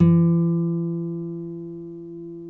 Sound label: NYL NV F#, nylon no vib guitars; Spectrasonics; Hans Zimmer Guitars VOL-1